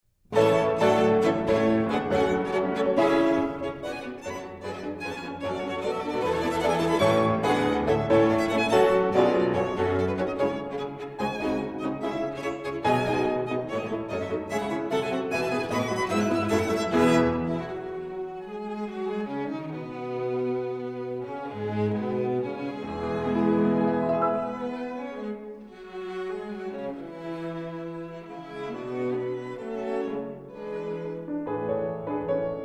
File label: piano quintet; foote